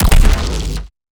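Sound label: black shield overload, impact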